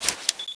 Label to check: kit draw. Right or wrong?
Right